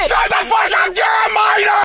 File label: a major, ambience